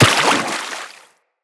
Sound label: Operator Run Water, Footsteps; Characters